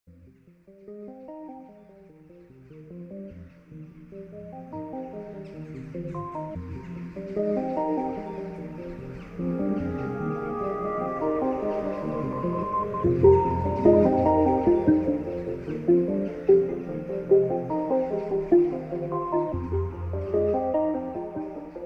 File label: instrumental